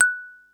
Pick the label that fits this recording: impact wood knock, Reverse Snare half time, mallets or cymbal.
mallets